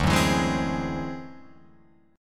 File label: D 9th Flat 5th D down Guitar Open F; strummed; JGuitar